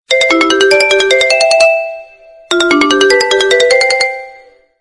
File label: sony ericsson